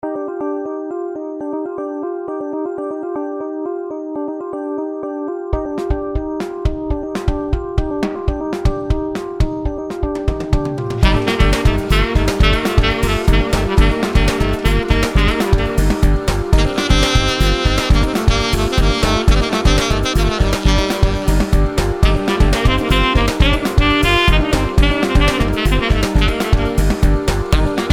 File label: saxi